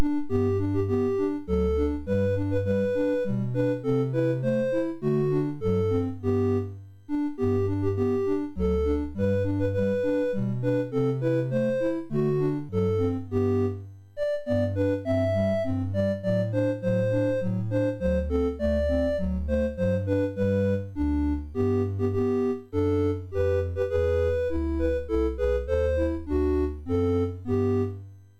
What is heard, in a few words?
Season Jingles, MOS6581R3